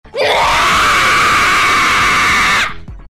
scream; rushia; svencoop

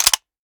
ammo in, enfield; svencoop; ins2

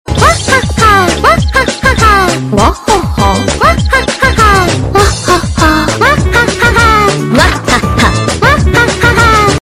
wahaha; svencoop; FZSaySound

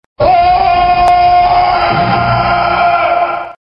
battlecry; svencoop; FZSaySound

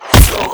headshot; player

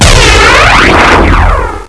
gluon hit wall; svencoop; custom weapons

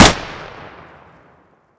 shoot, m1928; svencoop; ins2

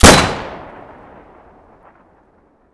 shoot, kar98; svencoop; ins2

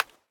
mag, groza; svencoop; ins2

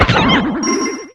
kill; svencoop; custom weapons

